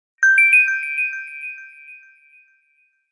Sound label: Vivo X20 Plus Sms Ringtone